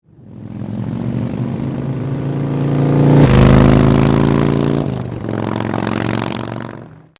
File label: FLYBY; Vance & Hines Shortshots Staggered Exhaust For Harley Softail 1986-2009